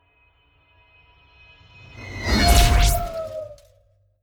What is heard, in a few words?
whoosh metal bow howl